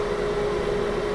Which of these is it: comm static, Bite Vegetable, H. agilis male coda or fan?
fan